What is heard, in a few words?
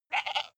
goat baa stutter male